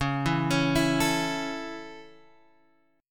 Db Minor Sharp 5th, Guitar, Open G

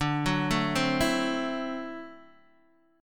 D 9th D down Guitar DADGAD

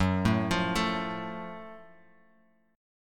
F sharp Minor Major 7th, Guitar Half Step Down

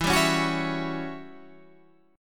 E Minor 6th Add 9th chord

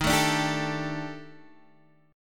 D sharp Major 7th Flat 5th, guitar chord; D#M7b5 Chord {X 6 7 7 8 5} strummed

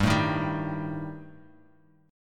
G Suspended 2nd Sharp 5th G; strummed; 3,0,1,0,x,x

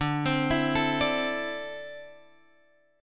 D 7th Suspended 2nd down Guitar Drop D

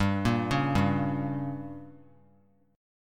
G Diminished; arpeggiated; JGuitar

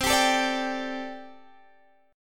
D 7th Suspended 4th, C

